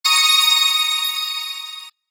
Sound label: Shimmer; Blastwave FX